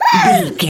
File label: goblin fairy attack low